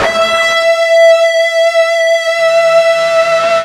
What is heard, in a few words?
FUZZ E 4, Guitar; East West; Steve Stevens Guitar Samples Collection